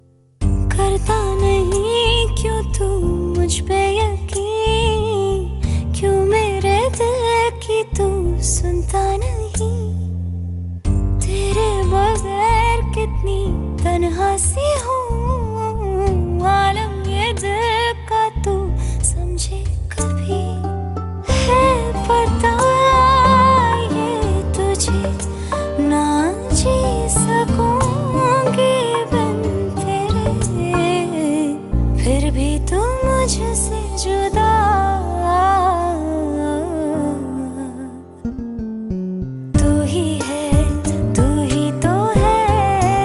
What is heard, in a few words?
Hindi Female Song Ringtone